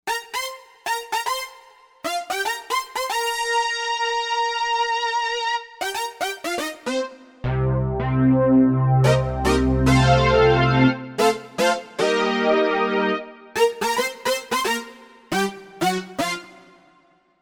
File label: The 80s Slappa, Novation UltraNova; Factory Patches